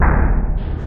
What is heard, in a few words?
oscilation, Misc Percussion Ticks